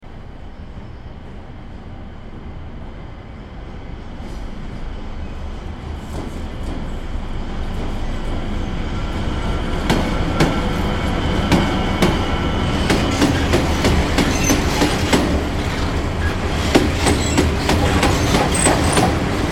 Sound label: torpedos